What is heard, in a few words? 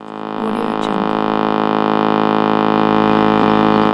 radio buzz